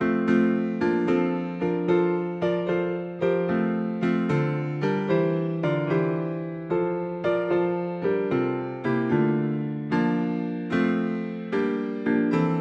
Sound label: Christmas songs music; Good Christian Men Rejoice